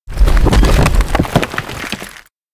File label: rock set down; anemoi